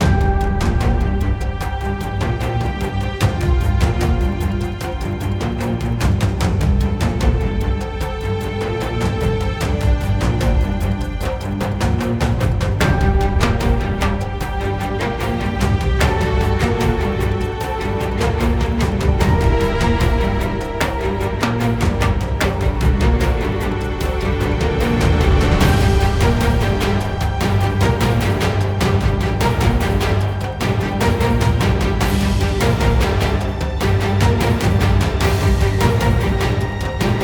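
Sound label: Music Percussion, Percussion Pre Race 75bpm; Forza Motorsport 6 Apex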